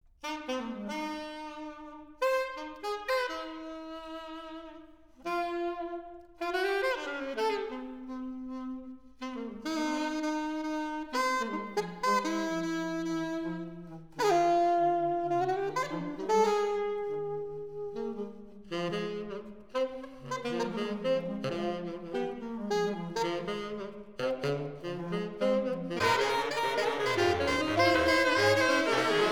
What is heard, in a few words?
Saxophone Quartet